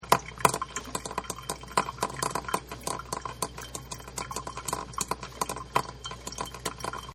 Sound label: Coffee Perc; Appliances; Household; Partners In Rhyme